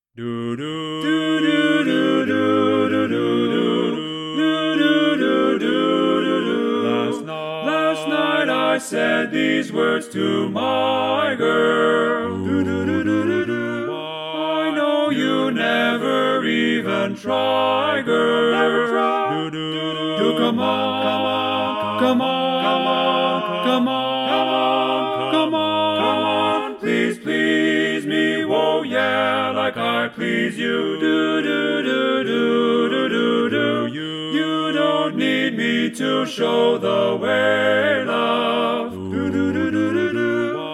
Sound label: Lead left